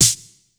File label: SN, Casio CTK-5000